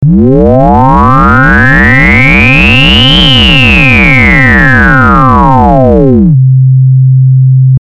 Mod Int lin FM

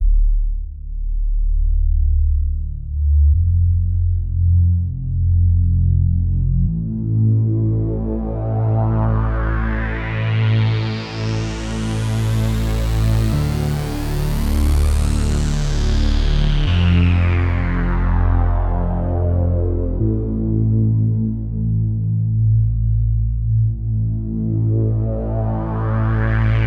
filter analog; synthmaster

